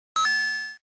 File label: coin